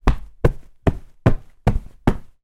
household sofa large cushion hit with hand multiple times